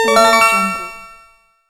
achievement

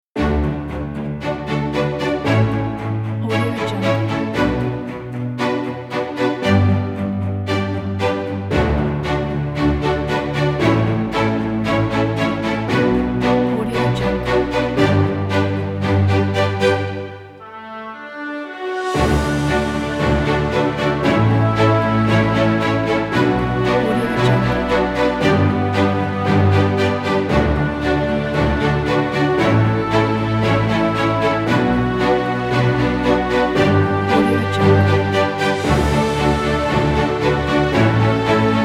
orchestral kit

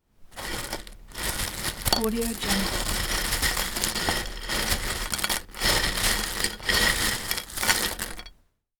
rustling

